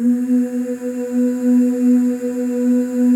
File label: OO, FEM OOS, Voices; Symphony of Voices; Spectrasonics; FEM.2 OOS